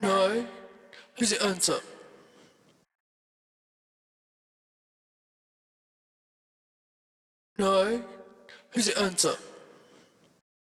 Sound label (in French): 2 voix